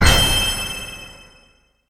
achievement

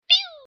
kill prop; css; devil-hunter-multigaming

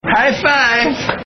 high five

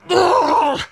pain severe; garrosh

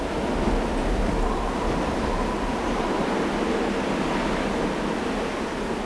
heavy wind, ambient

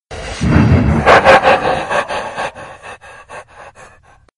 win; freak fortress 2; nightmare

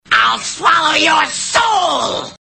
swallow

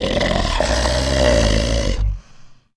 pain; slender; agrunt bm

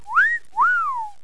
whistle; css; hideandseek

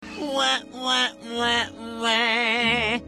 fail

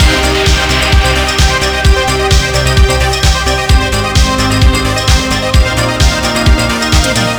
retro loop; slender; isabelle

